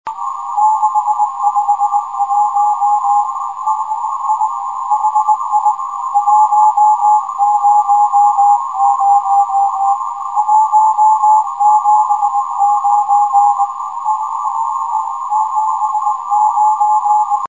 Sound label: beacon